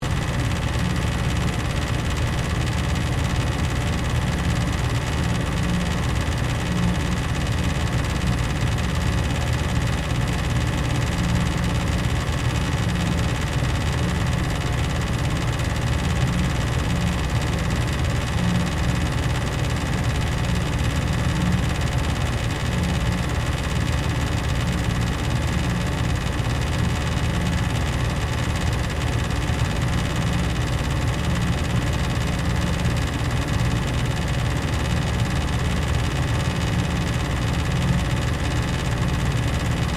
Refrigerator Run, Compressor, Motor, Restaurant, Loop; Machinery Sound Effects; SoundEffects-BlastWave